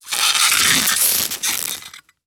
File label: dry ice sparks